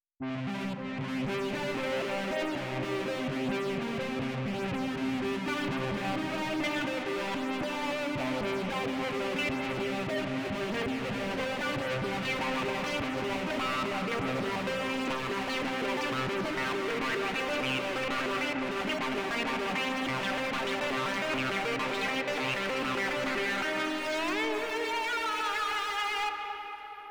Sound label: guitar